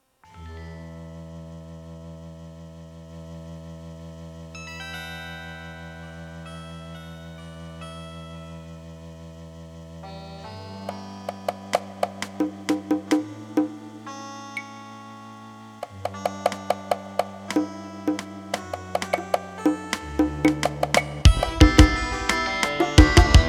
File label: SC-55MkII via CT2800 gameport malfunction; SoftMPU; MT-32